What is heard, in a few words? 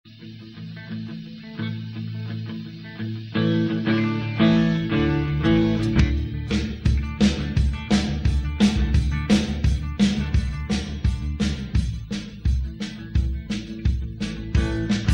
rehearsal